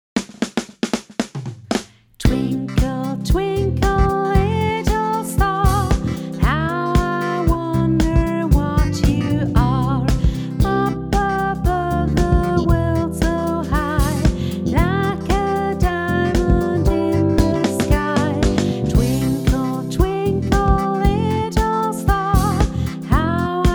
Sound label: Twinkle; Volkslieder